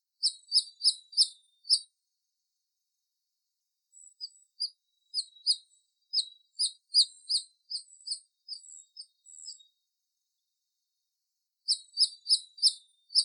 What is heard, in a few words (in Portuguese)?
Canto do bacurau tesoura gigante